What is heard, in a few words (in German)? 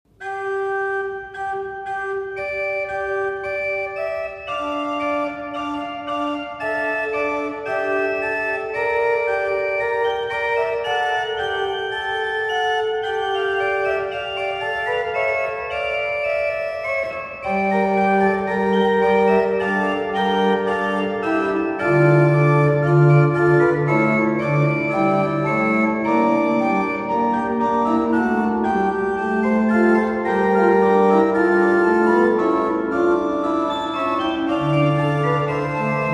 Canzon fuer Orgel; o clemens o pia